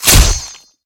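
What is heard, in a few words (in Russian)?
насаживающий проникающий удар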